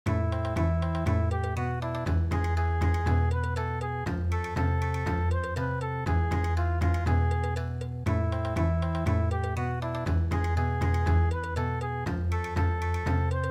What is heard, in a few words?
Bon Odori, midi; Hava Nagilah